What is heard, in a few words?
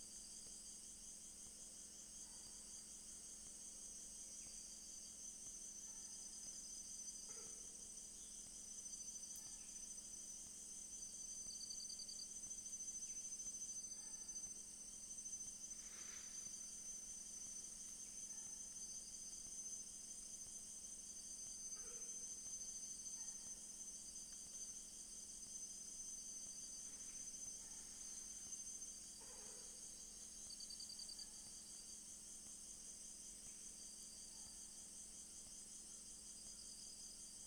cackle very loud; Open-Field-Recorder